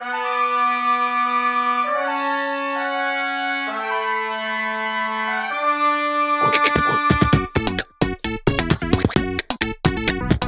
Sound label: Freestyle (Instrumental); Friday Night Funkin Jello; a BFDI mod